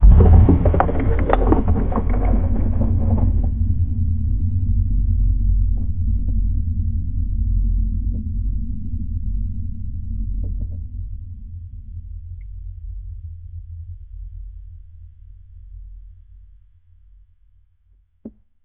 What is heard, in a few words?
inside a sealed wooden co